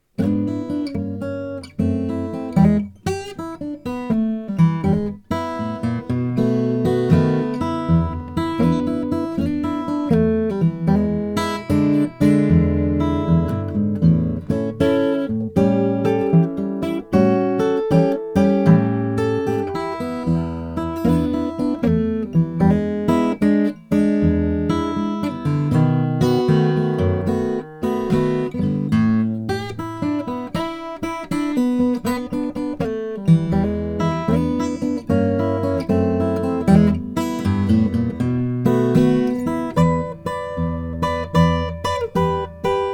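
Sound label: greven 0000 brazilian rosewood, Guitars; 1978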